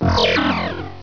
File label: laser; The Rift